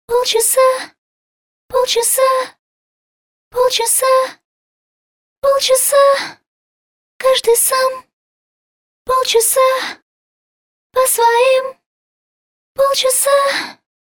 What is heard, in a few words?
Backs